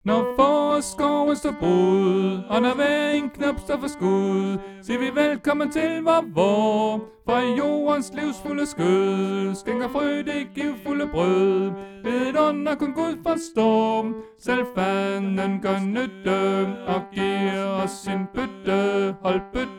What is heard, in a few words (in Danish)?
Tenor